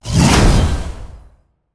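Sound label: Owl Bear attack; monster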